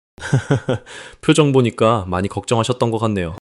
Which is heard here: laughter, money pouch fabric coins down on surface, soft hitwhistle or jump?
laughter